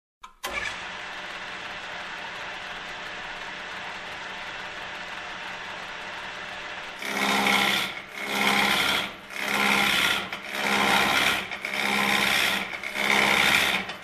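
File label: sounds of work on lathe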